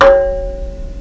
Tong; Réflexion; Mosaïcon

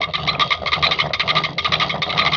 pull; BESTSHOT; noisybit

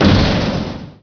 thud; Heretic II Demo

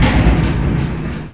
SHIP

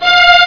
strings; CDTV Lemmings

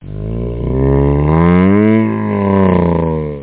Car

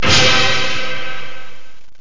orch hit; Sounds Terrific II; strings

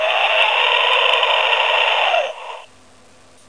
alien roar; independenceday-movie

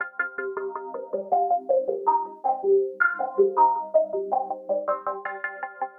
SYNTH AMBNT 160 C; Ambient; Keys

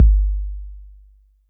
BD5050, TR808; L'Effet Pommier - Volume 04

